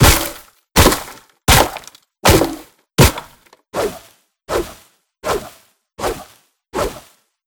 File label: Palm Tree Sound